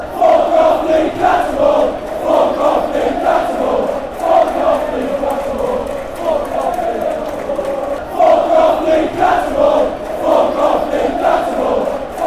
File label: fanchants; lee cattermole